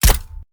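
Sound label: military crossbow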